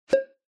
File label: Pop High; Onoma